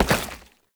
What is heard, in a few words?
Step Goo, Foley; Warframe; Oraxia